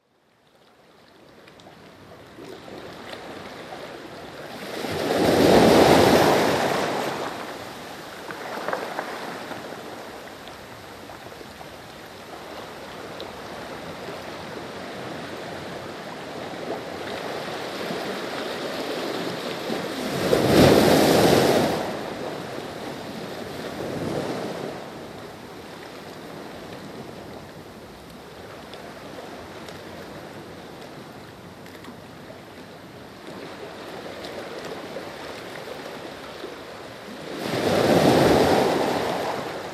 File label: waves